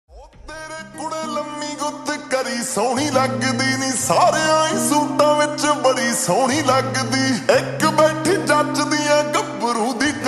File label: Slowed Reverb Ringtone